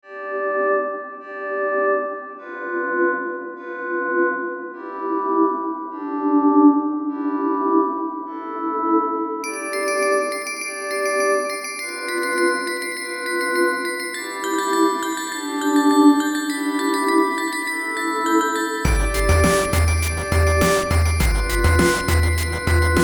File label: Crystal Cacophony